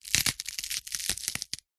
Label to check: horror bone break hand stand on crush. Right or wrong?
Right